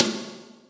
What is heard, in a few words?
Toms, Real